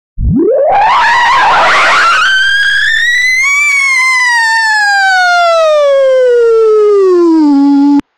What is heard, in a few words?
pop pop pop